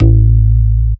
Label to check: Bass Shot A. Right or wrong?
Right